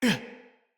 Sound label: Pain, Battle Barks; Envoy